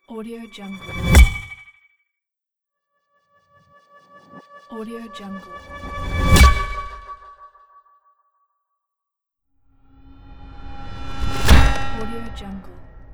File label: tonal transform impacts